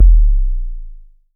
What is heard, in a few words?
deeep n low, jungbass